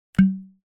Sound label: bottle pop, Bar and Coffee Shop; SpillAudio